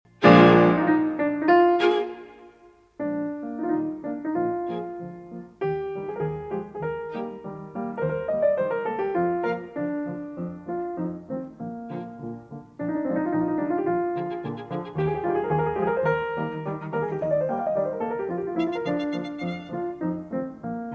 Piano Trio No. 7 in B-Flat Major IV. Allegro Moderato; Op. 97 Archduke